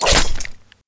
knife hit wall; cstrike; JailBreak; TribalButterfly

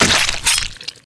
knife hit; cstrike; JailBreak; TribalButterfly

